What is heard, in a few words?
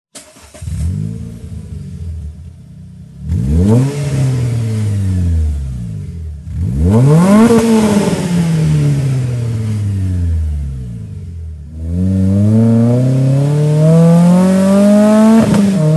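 Evolution Renault Clio III RS 200, exhaust; link pipe set akrapovic; phase 2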